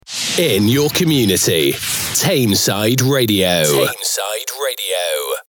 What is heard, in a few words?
Jingle Sweep